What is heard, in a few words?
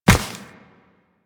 Step Ice, Footsteps Walk; DE; Warframe; Tenno